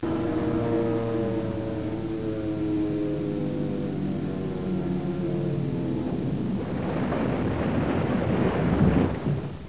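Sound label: plane crash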